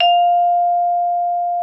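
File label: vibraphone F5; Xsample VOL-4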